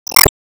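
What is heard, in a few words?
Sound Effect Enemy Slime Crush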